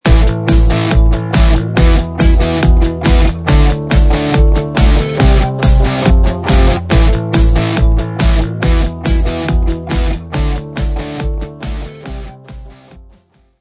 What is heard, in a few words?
Positive Short Loop Part 1 Fade Out